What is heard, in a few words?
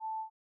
jingle